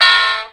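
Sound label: metal bar, metronome; TJ Metronóm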